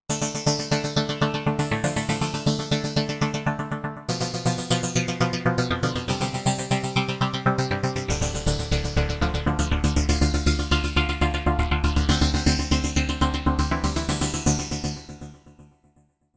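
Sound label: Sequence Sync Echo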